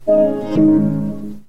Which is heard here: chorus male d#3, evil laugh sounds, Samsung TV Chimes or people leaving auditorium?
Samsung TV Chimes